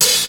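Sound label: hi-hat d&b open; 300 Drum Machines; Yamaha Motif ES-Series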